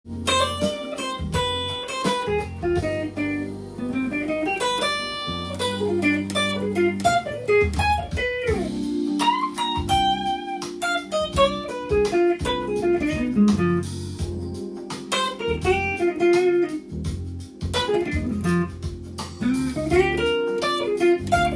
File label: dim solo cm